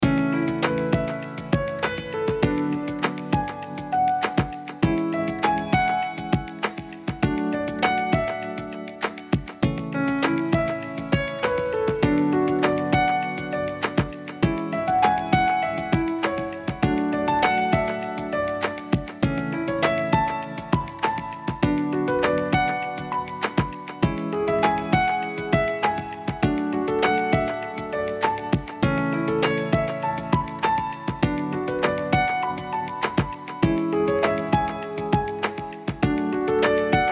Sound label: loop positive and gentle piano song